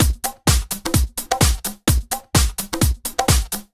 House Beat, 128 BPM

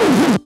Synths C; Vengeance Samples

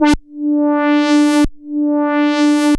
Roland A D4, synth samples; Roland S100; musicradar-synth-samples

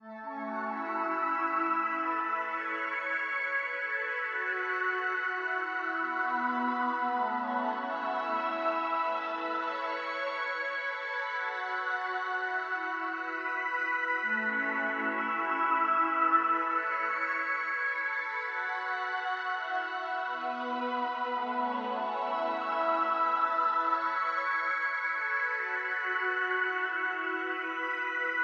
135BPM Atmo pad, F# minor; Melody & Synth

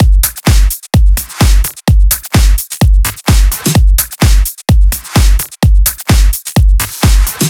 Freak Drums 2, 128BPM; Vengeance Samples; Freak Kit

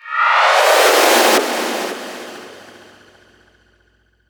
VFX Short Fills & Reverse

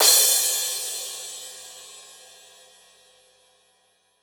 Cymbals crash; Vengeance Samples

